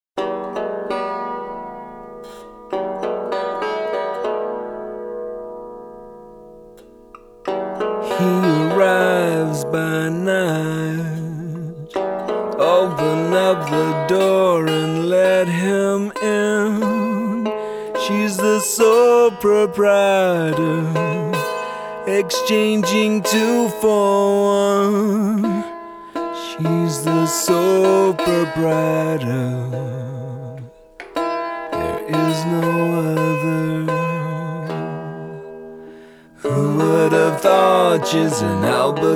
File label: dobro; albuquerque